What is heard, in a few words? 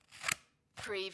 scissors cut thick paper